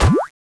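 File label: coil explosion; zmall; ghost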